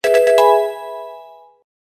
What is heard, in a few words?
Fanfare - Level Up